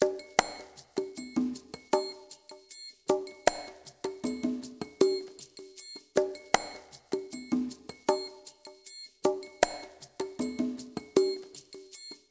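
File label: ICE PERC MIX, Liquid Grooves; Spectrasonics; Liquid Grooves 1 & 2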